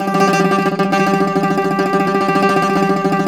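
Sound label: acoustic bouzouki tremolo G 2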